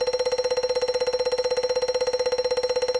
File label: count up loop; rise of maya